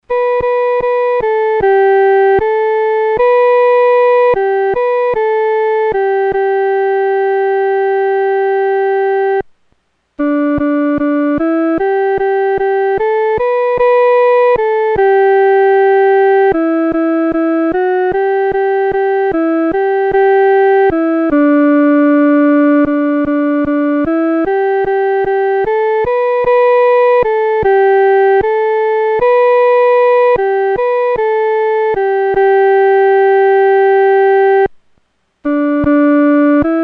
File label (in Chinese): 独奏（第一声）